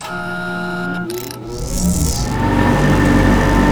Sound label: generator start; Dystopia; exodus